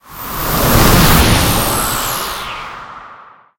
wild reveal; dazzle

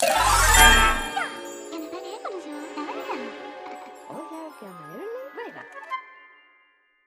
average Win; fairyhansel

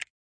button Click; hotline

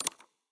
info button; frenchroulette3

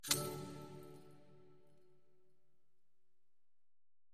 spin Button Quick Stop; fairyhansel

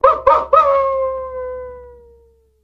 scatter howling; wolfcub

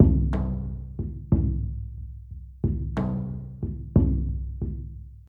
feature Splash Loop; jumanji

